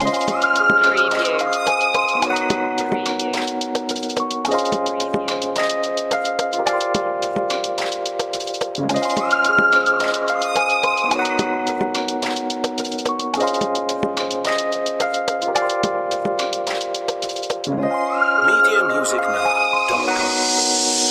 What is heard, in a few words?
hip hop loop